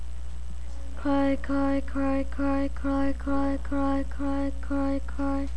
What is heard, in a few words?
cry